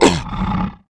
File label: middle damage, voice